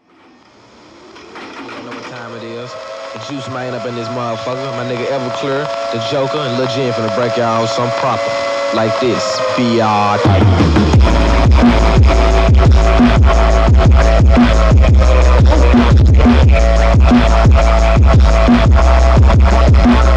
Mexican Phonk

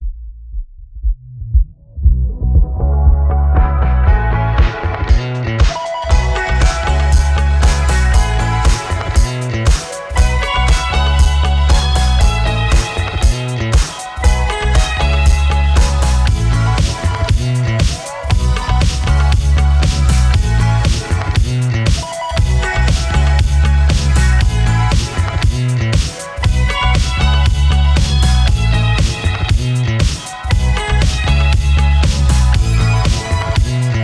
Future Funk

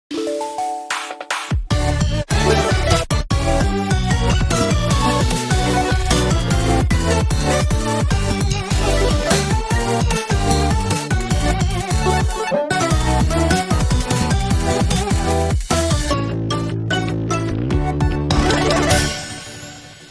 Gibberish music

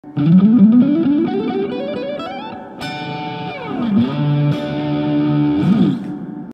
Fast D Major

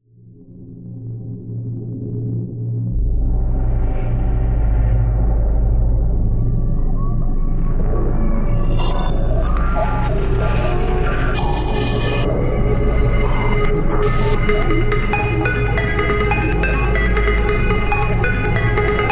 Groove

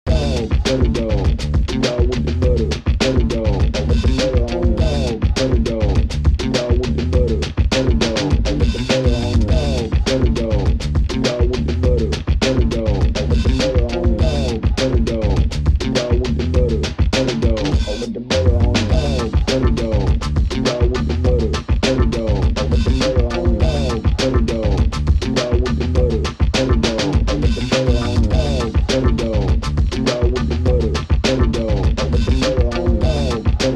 beat

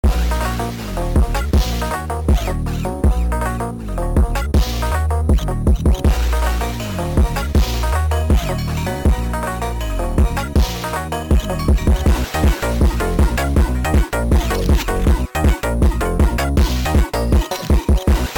SPED UP

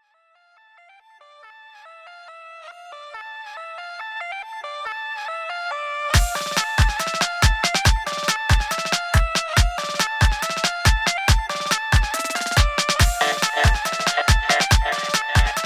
Rap Beatz

